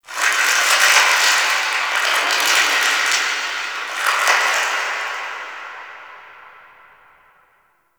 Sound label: Ice Distant Ice Melt; Warframe; Corpus Ice Planet; Ambience